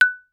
Xylophone hard rubber ff Gb6 stereo; MIS Pitches - 2014; Percussion

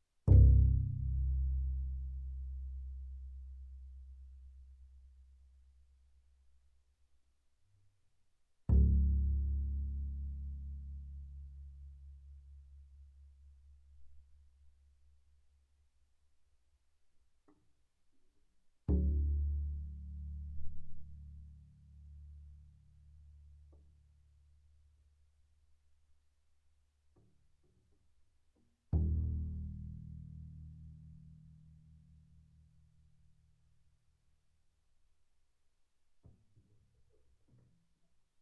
Bass pizz sul C ff C1 Eb1, double bass

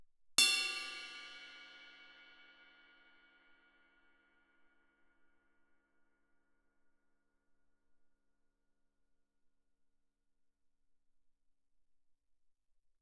crash stick bell ff, suspended cymbals; Percussion

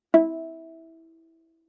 Viola pizz ff sul D E4; MIS Pitches - 2014; Strings

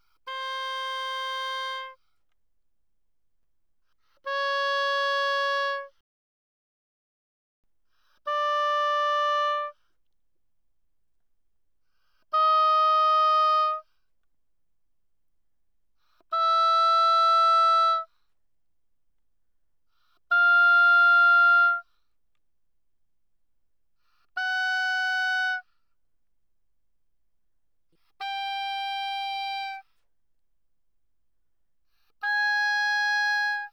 Oboe ff C5 B5, woodwinds

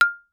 Xylophone hard rubber ff F6 stereo; MIS Pitches - 2014; Percussion

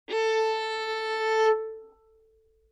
Violin arco ff sul D A4; MIS Pitches - 2014; Strings

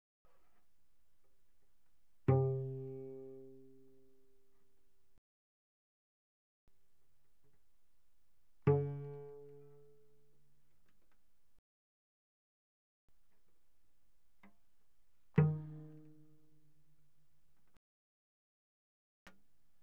Cello pizz mf sul C C3 Bb3; Strings

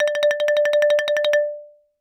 Xylophone hard rubber roll ff D5 stereo; MIS Pitches - 2014; Percussion

